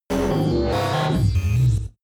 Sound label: space computing big machinery